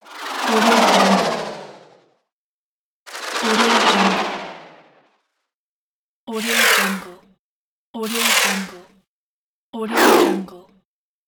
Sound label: scifi swooshes